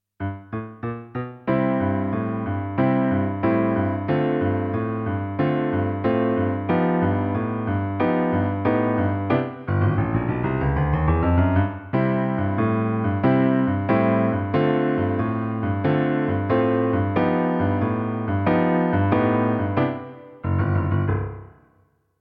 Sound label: karaoke